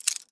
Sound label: pick movement, lockpicking